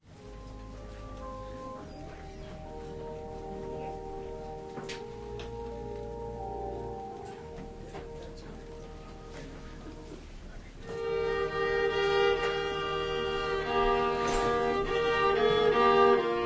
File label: Organ and violin prelude